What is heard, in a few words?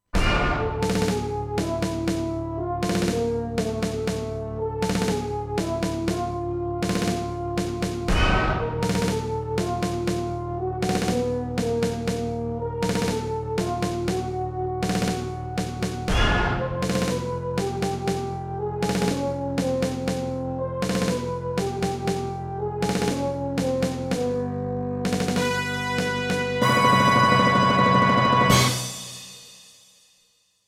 SC880; Dune 2